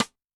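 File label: Snare Side Stick; Yamaha DTXpress-II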